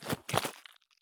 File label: turn gravel; Escape from Tarkov